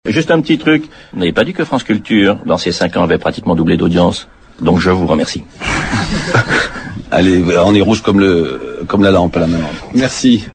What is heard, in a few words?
audience; déclaration de JM Cavada